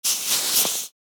Polyester movement scraping; foley

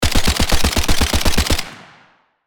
FN SCAR assault rifle short burst; gfxsounds

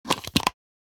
Skin care cream jar open

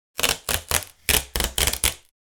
Packing tape medium and long pull

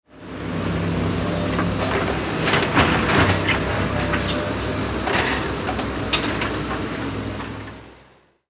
Excavator construction vehicle bucket dump

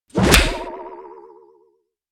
Cartoon slap; cartoon impact

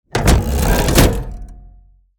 Huge mechanical lever pull; Steampunk; gfxsounds

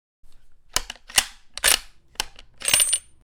Sniper rifle round ejecting

